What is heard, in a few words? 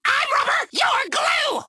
Rubberangs; Warmonger Archer